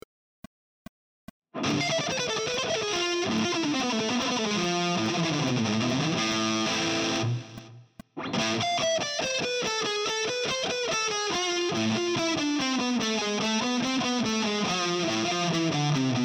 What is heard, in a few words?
harmonic minor